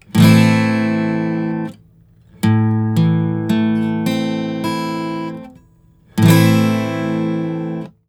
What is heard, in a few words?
Major or Minor